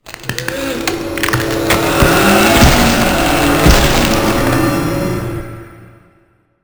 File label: curved transform